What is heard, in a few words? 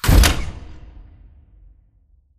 Solar Panel Rotate Stop; Boomtown; Titanfall 2